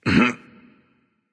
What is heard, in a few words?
lift; smite; voicepack